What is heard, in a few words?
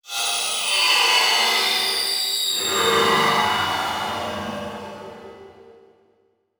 Transition Stab Dark Metallic; Production Element